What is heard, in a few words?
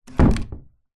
household door internal wooden modern close close up